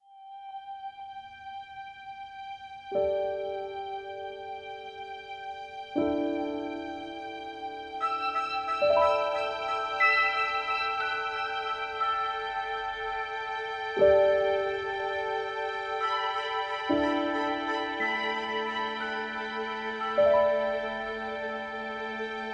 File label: Gleaming Ambient